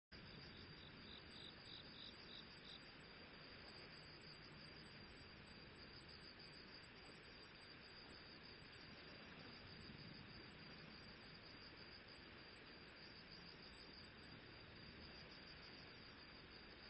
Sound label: riverside